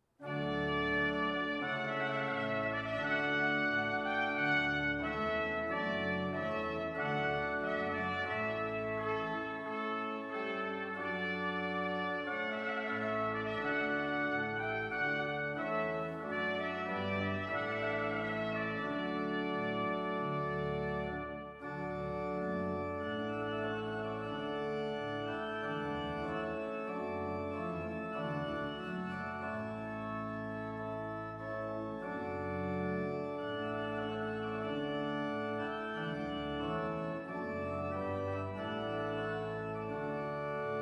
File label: Trumpet Voluntary